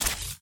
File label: atk arrow reload